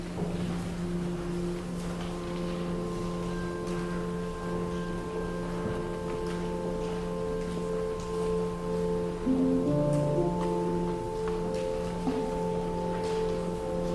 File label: singing bells